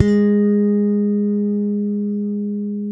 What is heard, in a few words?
SLAP G#4, Bass; Spectrasonics - Bass Legends CD 1; ALL THUMBS